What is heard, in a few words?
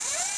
droid servo